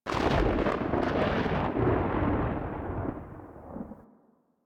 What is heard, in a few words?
Landscape Thunder Crack, Ambience; Warframe; Venus Open World; One Offs